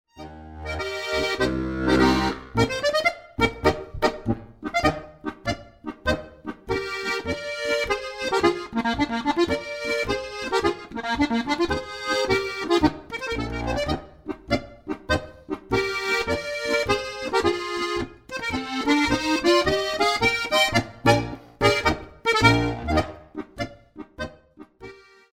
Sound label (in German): feuerwehrmarsch